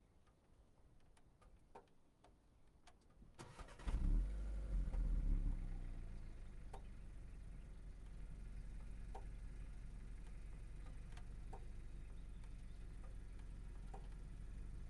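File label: vw golf exterior idle start blips off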